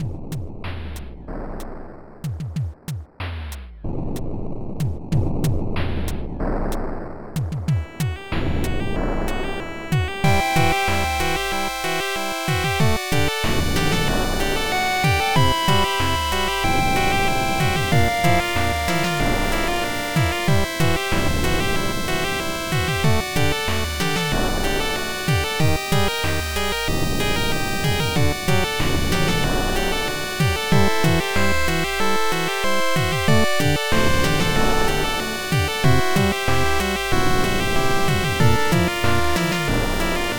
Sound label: Internal Percussion; Cave Story